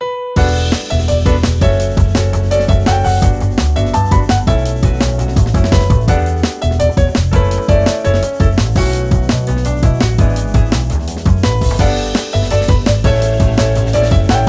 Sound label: Music Menu event